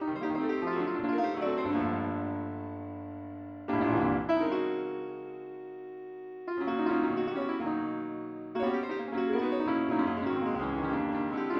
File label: piano